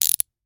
Rachet, vanadium steel, 8in, standard, locking, clicking, mechanical, new, socket, rotate, quick; Eiravaein Works; Vaeyan IV